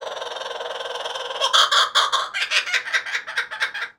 Kookabura, sound effects; zoomerang

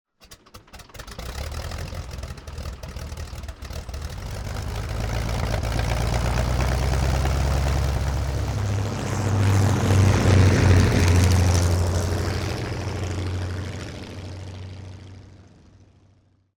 Bush plane Taking Off; operation-arctic

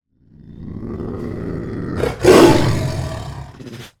jaguar growl; incredible-race

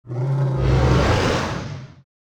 roar; keepers-kingdom

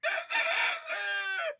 rooster crow; emerald-crossing

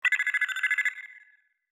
remote button; ocean-commotion

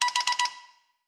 Deception Detector Alert; ocean-commotion

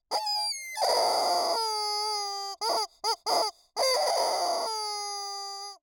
offended chicken clucks; wonder-junction

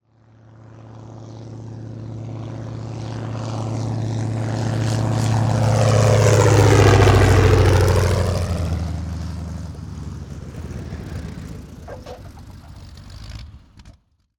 Bush plane arrival; operation-arctic

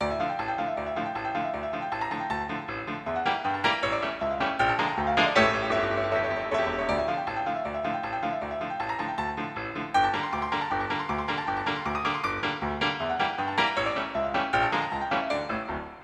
chase music; mystery-island

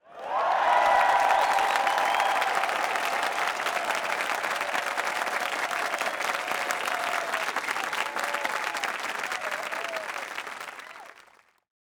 Clap and Cheer; zoomerang

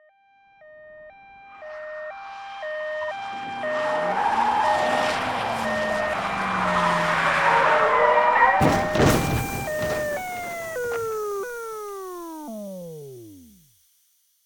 siren approaching with crash; mystery-island

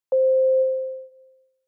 inflight announcement ding; incredible-race

